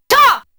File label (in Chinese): 攻擊; 夏洛特聲音資料庫